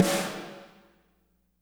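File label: reverb snare, WET WHACKER; Spectrasonics; Burning Grooves CD2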